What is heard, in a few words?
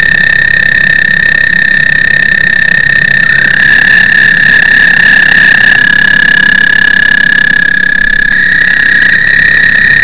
american toad